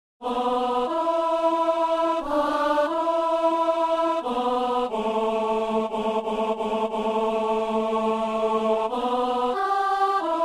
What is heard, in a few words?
alto